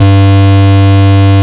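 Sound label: bass distortion G2; LIMAT LSDJ kit; LSDJ Extra Bass Notes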